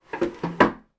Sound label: household table move drag carpet hits chairs